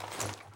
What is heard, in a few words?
throw, female